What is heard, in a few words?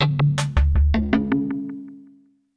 PERCUSSN, AMBNT, 160; Ambient; Drum